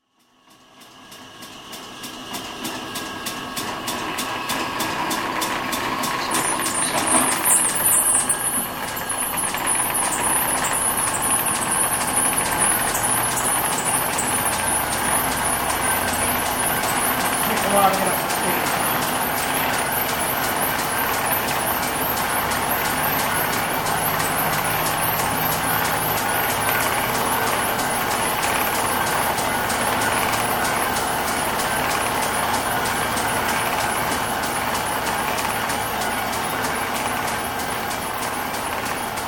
diamond fibres mill spinning machinery spinning yarn from combed fibres